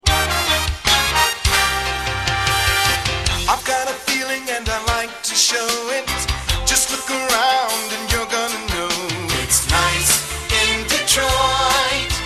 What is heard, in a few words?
Jingle; WNIC-FM